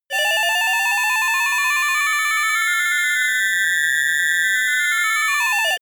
futuristic computer

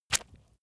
leather impact mud soft squelch

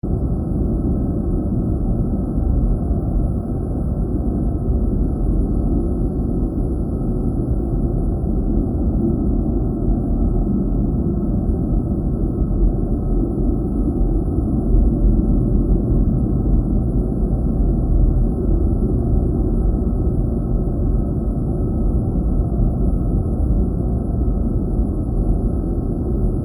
subsonic deep noise atmo

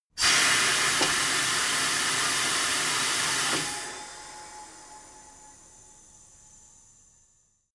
tire changing machine hydraulic out

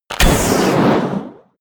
bow shot fire

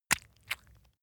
leather impact mud medium

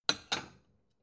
plate small shelve soft

